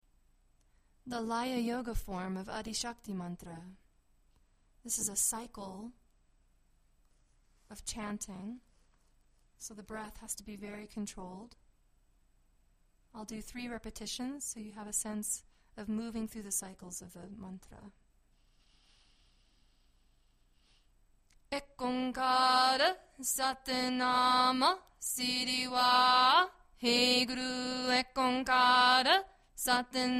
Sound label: Adi Shakti Mantra Laya Yoga Form